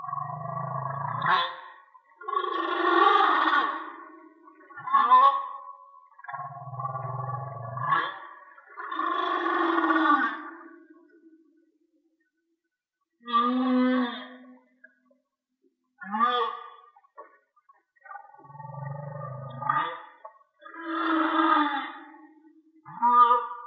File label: Whale Song mono; whale dolphin watching